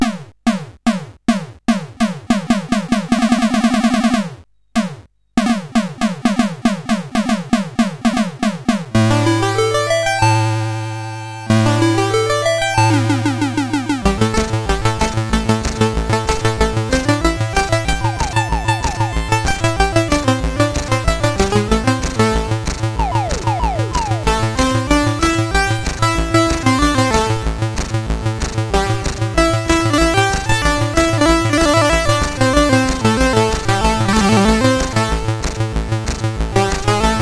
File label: Paddle Mania, CSG8580R5